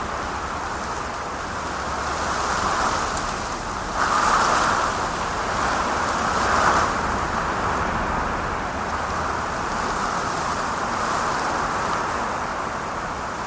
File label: Amb Weather; Red Orchestra Ostfront 41-45